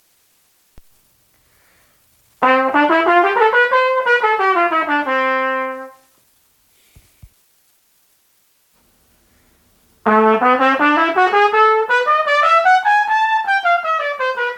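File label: Audition Scales, trumpet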